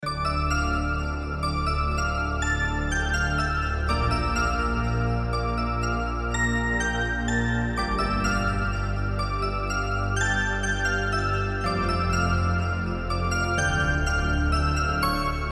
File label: bgmusic